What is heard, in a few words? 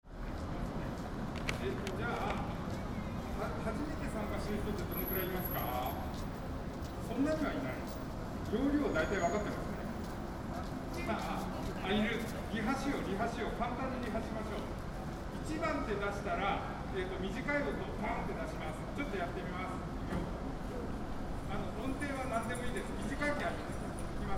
rehearsal